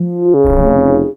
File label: Character Hit; MAD